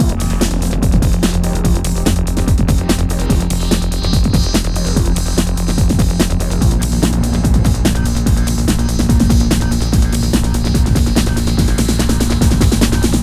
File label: Decimater, Hardcore Breakbeat 145 Cmin; Big Fish Audio; Hardcore Breakbeat Action